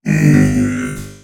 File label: Golem Death